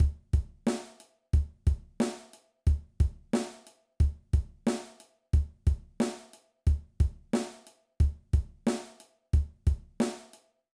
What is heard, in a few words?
Basic Drum Beat